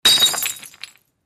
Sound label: Drinking Glass Smash; crashes & creaks